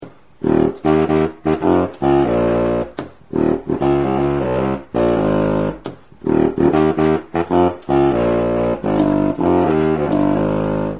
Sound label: sarrus